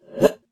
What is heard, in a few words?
weird, sfx